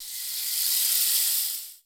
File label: Rain Stick; AKAI S-Series CD-ROM Sound Library VOL-5